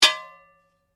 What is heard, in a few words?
Blade Heavy Moving Impact Ring, Sword; Foley sounds for melee weapons; Swords; Combat; SoundEffects-Foundation1